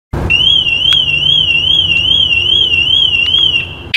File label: car alarm